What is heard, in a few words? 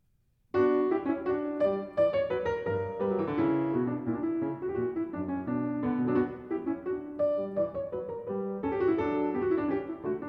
French Overture In B Minor